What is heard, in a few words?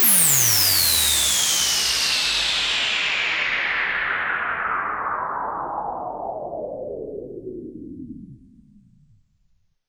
Sweeps Down